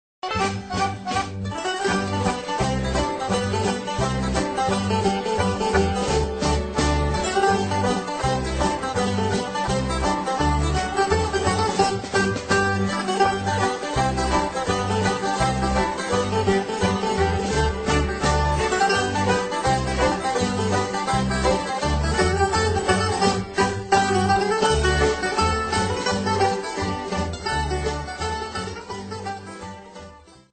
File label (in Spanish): música de Holanda